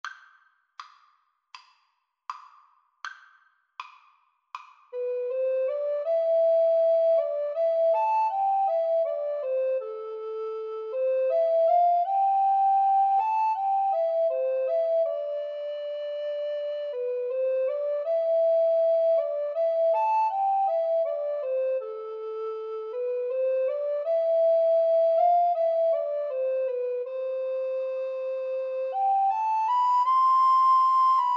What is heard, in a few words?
2AREC, Alto Recorder Duet